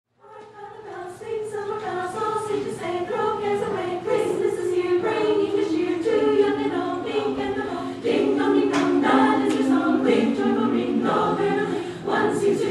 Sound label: Chorus Christmas Carol of the Bells